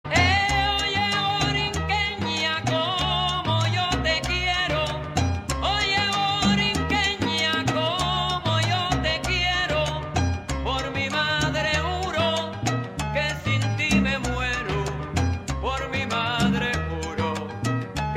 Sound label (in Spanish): salsa